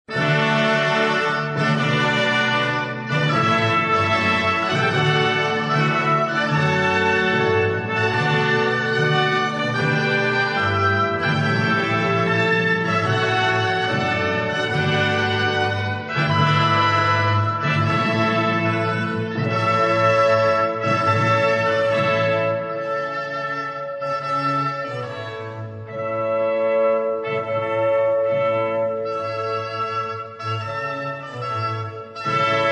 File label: firework; handel; Music for the Royal Fireworks